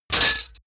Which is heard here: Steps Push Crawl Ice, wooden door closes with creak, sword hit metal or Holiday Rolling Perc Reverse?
sword hit metal